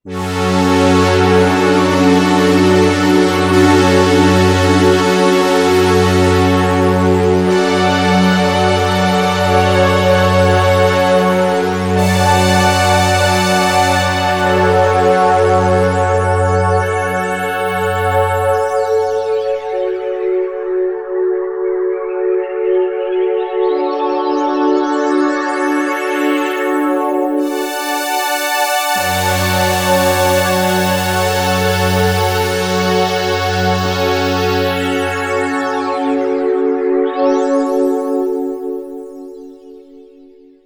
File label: pads; nappes ambiantes vivantes et planantes; arturia origin; Le même joystick combiné à des oscillateurs à tables d'onde permet de recréer des ambiances à la Prophet-VS en temps; 2 modules de filtre et le joystick